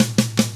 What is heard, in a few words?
ROCK; ILIO; Double Platinum Drums 1